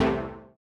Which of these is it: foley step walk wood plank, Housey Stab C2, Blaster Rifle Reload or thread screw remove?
Housey Stab C2